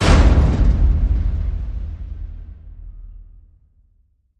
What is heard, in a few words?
Hit Close the Bin